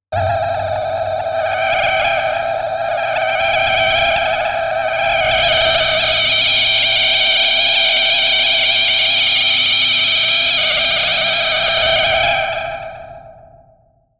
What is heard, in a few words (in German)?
Krank